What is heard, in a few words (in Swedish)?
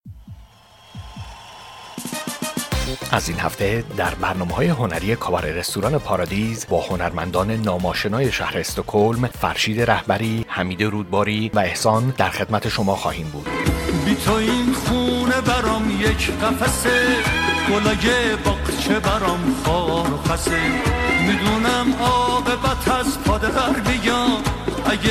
karaoke